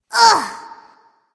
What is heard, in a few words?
ugh; smite; ratatoskr